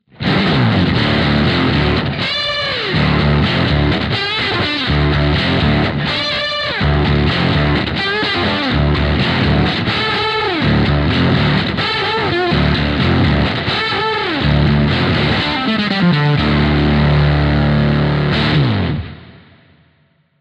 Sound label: X DRIVE PURPLE; xgear